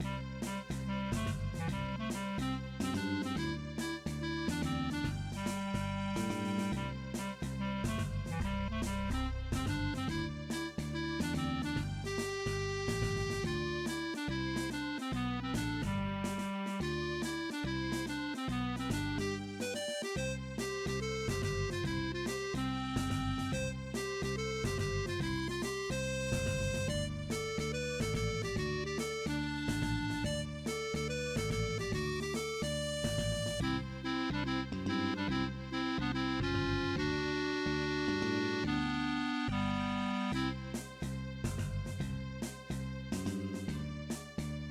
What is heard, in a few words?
happy; commander genius